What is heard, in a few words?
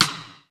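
RIM, SR16 snare; musicradar-synth-samples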